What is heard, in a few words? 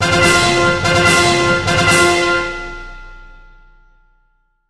Jingle Dance; Chinki Dance; Dandy Dungeon Legend of Brave Yamada